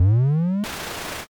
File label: machine start